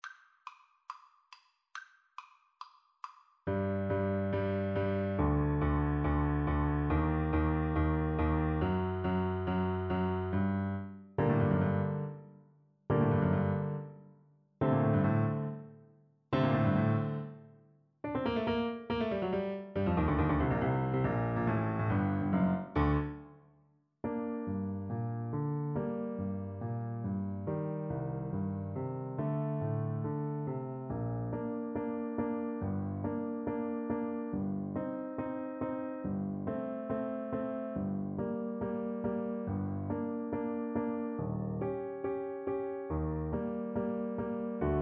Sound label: piano duet